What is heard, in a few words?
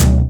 Drum Barrel Snare